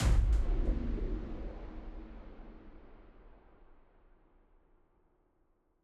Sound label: Low Impact